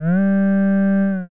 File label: horagai